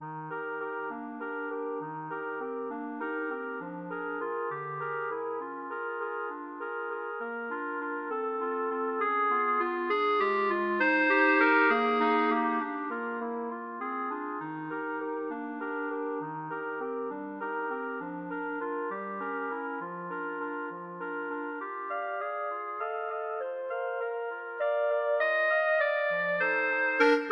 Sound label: tchaikovsky waltz, clarinet trio